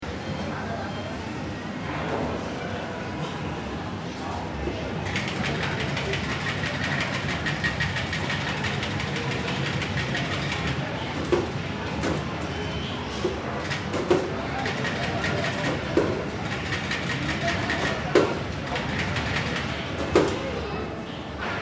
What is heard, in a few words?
Arcade Hall Loop, Amusement Center, dense crowd, many people playing; video games, billiards, shooting games. Seamlessly looping; Arcade Sound Effects